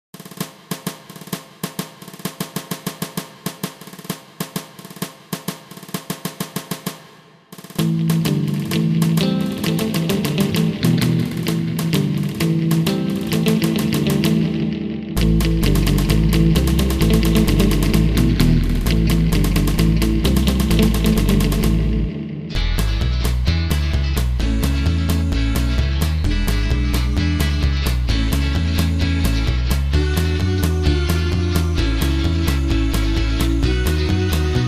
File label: backing